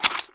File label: 357 empty, weapons